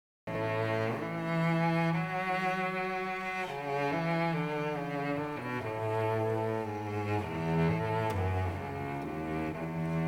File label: Sonata In A Major, cello